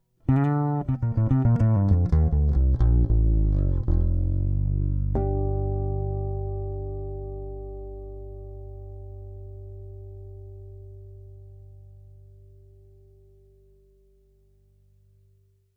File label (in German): soul passiv hals, bass